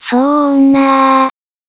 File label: death